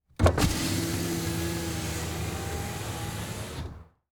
Transportation Chevy Trailblazer Open Window Interior